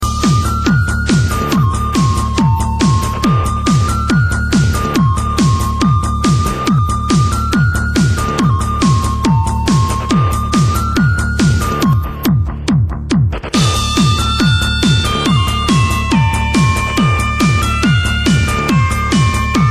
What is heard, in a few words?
celtic dance